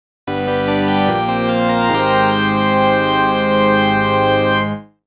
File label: Reward Jingle Event Clear; Etrian Odyssey II Heroes of Lagaard